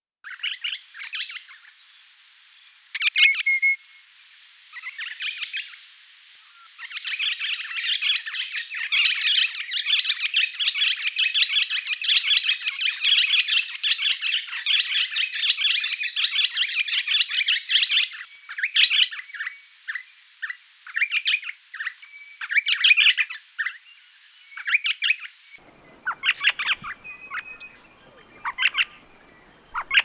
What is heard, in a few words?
eastern rosella, parrots